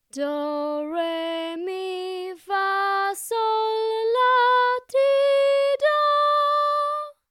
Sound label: solfa scale; If You’re Happy